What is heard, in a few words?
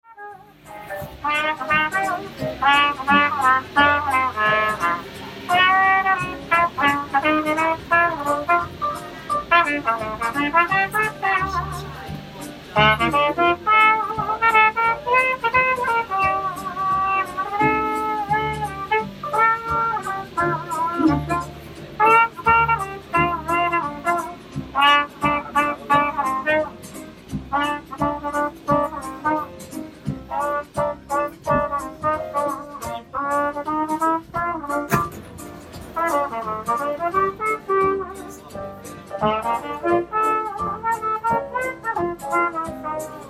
Paris Metro musician; Paris Metro car